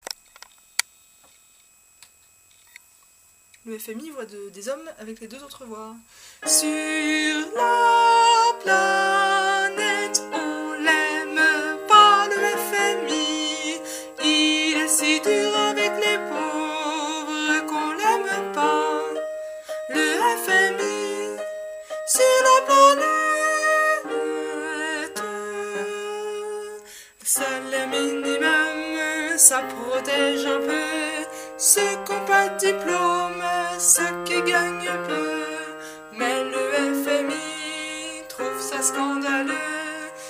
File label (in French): tenors et basses, chorale voix